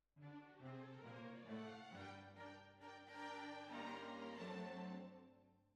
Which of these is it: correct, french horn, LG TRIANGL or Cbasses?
Cbasses